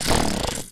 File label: Pig grunt